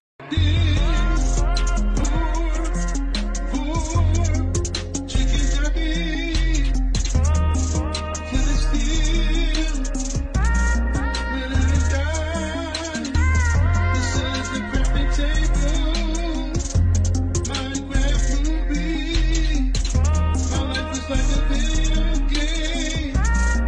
singing; audio meme

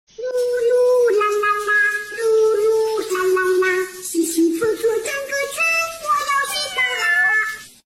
cat singing in shower; green screen audio meme

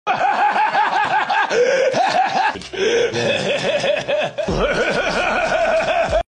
laughing; seth rogan; audio meme

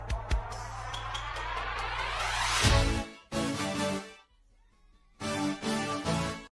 goofy; straw hat; audio meme

